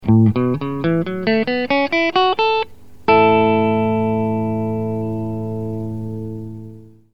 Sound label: a major pent scale